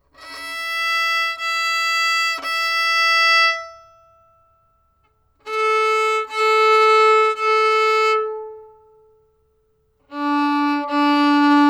violin bowed

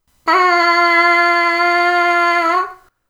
trumpet mouthpiece

